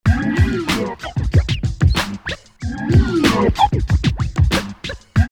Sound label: Acid Scratch 94, drumloops OldSchool; X-Static Goldmine